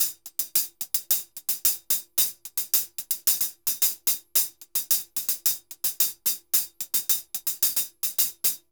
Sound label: HH Murga 110 bpm; Best Service; Festa Latina VOL-1